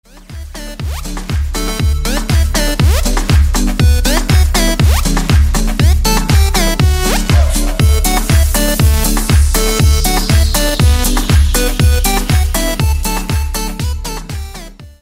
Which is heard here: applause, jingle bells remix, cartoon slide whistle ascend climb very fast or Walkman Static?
jingle bells remix